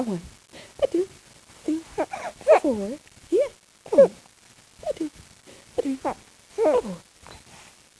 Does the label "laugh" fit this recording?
Yes